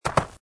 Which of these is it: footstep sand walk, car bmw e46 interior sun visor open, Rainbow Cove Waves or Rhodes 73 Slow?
footstep sand walk